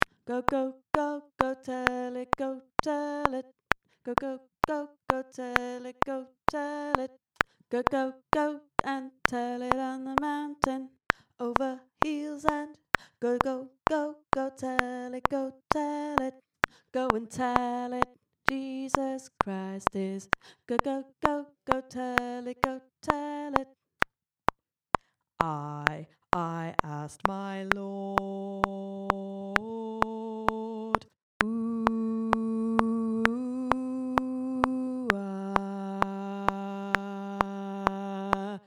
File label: Tenor; Go Tell It